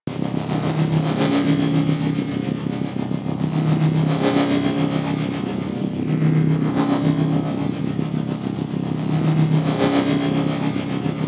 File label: Rail Jack Ambient Machine Loop; Warframe